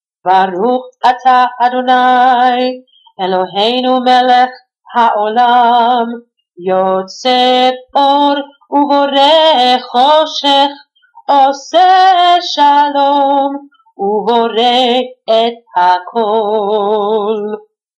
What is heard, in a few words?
Yotzer chanted